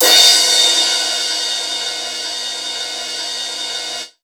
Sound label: CYMB; Houseworx; Drums; Cymbal